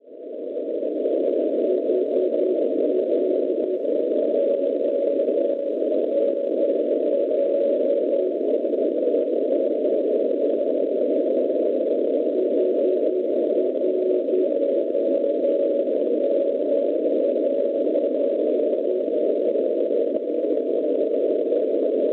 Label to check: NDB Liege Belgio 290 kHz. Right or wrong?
Right